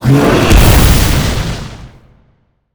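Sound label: boss attack; monster; grogo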